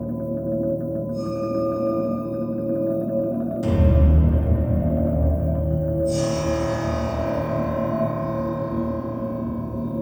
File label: Amb Battle; shurado; android